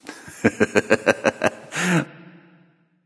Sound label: laugh; Smite; baronsamedi